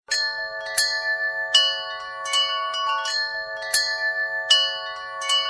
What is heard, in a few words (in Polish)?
Dzwonki wietrzne